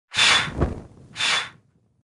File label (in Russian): Svechi zaduvajut